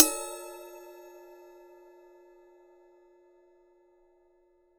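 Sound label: Cymbal Ride 51cm; Polyend; Simulacrum Sounds; 1 Shots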